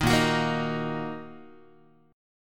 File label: A sharp Suspended 4th Sharp 5th A sharp, Guitar; strummed; JGuitar; 6,6,9,8,7,x